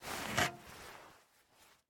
Take Big Key; MiSide

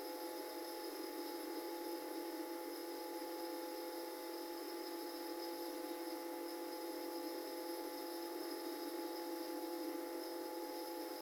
Lamp; MiSide

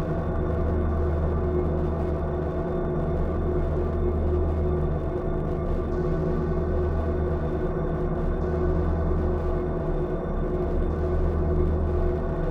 Ambient Run; Game rip; MiSide

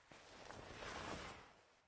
Hug; game audio; Mita; MiSide

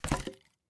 Firewood Drop; Game rip; MiSide

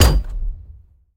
Step; MitaCore; MiSide

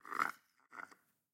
Juice Drink; MiSide

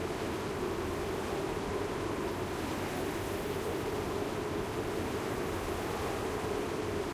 Wind Fall; Game rip; MiSide

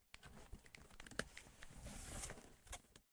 Hands Taker; MiSide

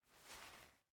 Hands Down; MiSide

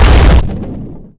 Rocket Explode; MiSide

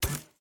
Portal Bolt; Game rip; MiSide

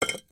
Sphere Drop; MiSide

